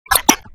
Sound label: jerky, spirit; slender; dbd